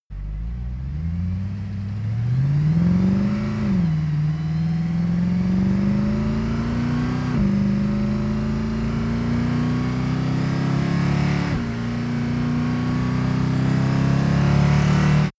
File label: car mercedes sls amg start drive accelerate